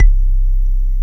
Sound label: VELIMBA; MORPHEUS